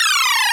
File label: loop